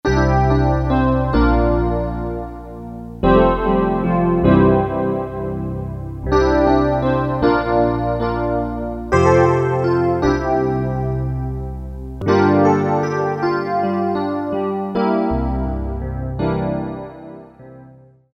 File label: E. PIANO; Flutes & Harp